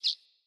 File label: Bird Single Chirp; Pothia Bird Guide